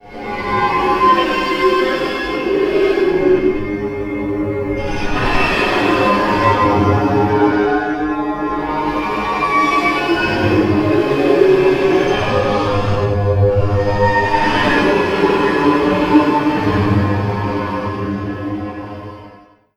guit disto

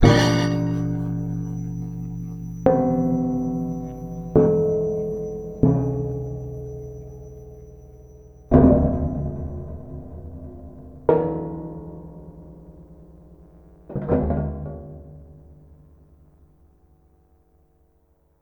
pincés roulés

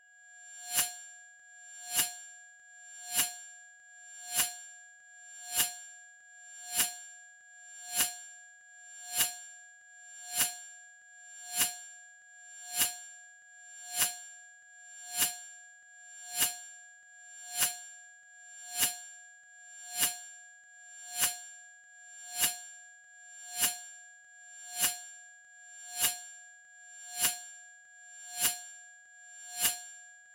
couvercle casserole

